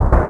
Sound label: explosion far start; World of Tanks Blitz